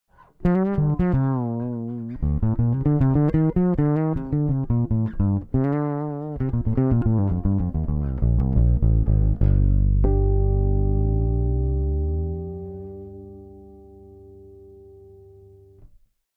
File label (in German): soul beidepus piezo hoehencut